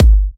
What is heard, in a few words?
G, kicks; markus hakala kicks